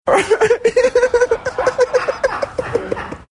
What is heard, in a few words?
cute laugh